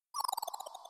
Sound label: wii disc not found sound